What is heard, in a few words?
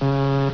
outta time